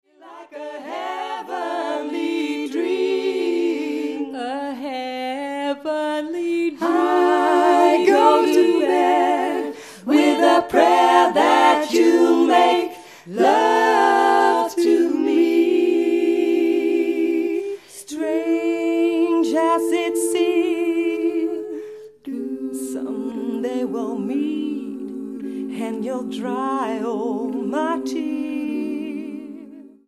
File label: ssatb